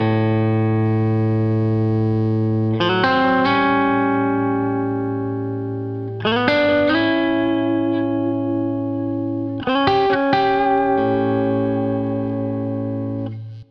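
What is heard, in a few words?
Country Guitar Breakdown; Digidesign; Pro Tools Essential Loops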